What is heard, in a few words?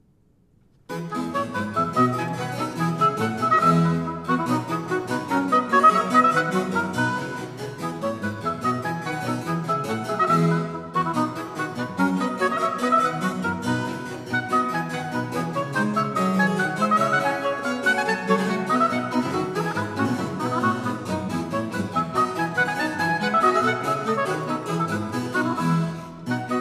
Water Music Suite in F Major Bouree Hornpipe Bouree; Handel